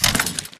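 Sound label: Tick Movement Footstep Jump Land; Titanfall 2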